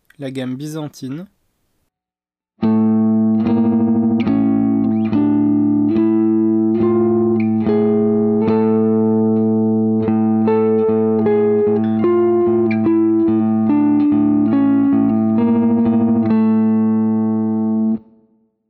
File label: single String byzantine